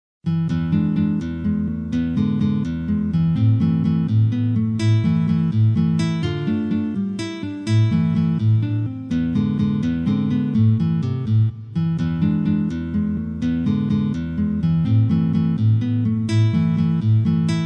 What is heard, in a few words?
guitar; Waves of the Danube